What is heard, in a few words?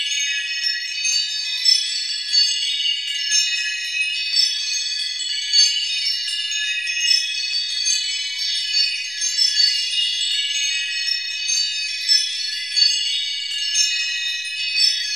BELL TREES; Zero-G Ambient 1; AMBIBELLTREE